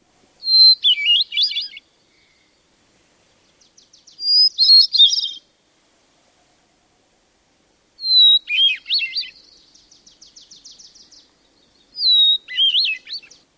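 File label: Hermit Thrush, song number 3; AK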